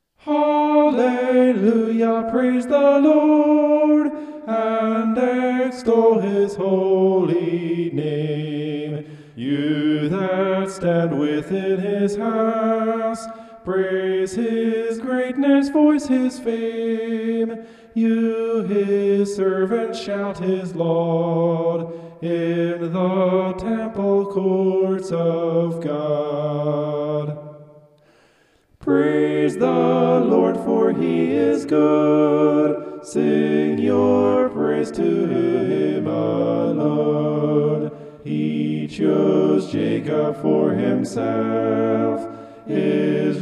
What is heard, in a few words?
vocal homoph; psalm 135